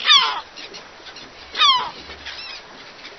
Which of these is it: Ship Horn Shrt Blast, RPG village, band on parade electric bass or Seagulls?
Seagulls